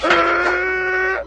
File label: laugh; tusken